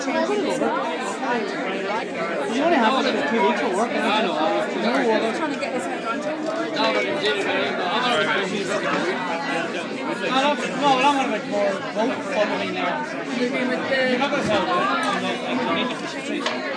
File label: pub soundscape; in letterkenny